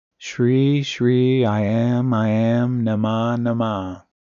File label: mantra